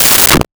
Radio Hit; Transitional Elements

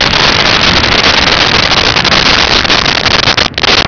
geyser vent

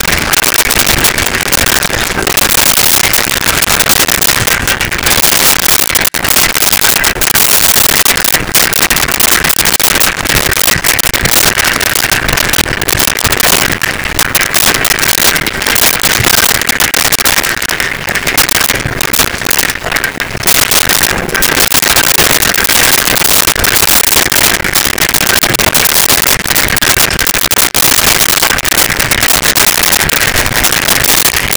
Bicycle Pedal Steady; Vehicles; Bikes & Motorcycles; SONY PICTURES SOUND EFFECTS SERIES

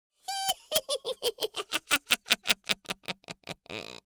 Witch Cackle, Horror

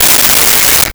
Metal Slide

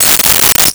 Glass Break; Crashes

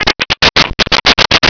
vox droid; pdroid

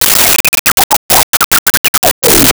Little Droid, Science Fiction

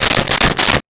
Staple Gun; Tools; 1001 Sound Effects

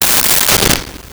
Flamethrower Burst; Weapons & Explosions

